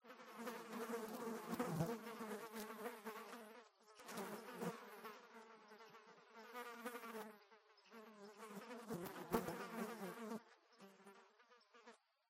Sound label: flies; Golden Crayon Sounds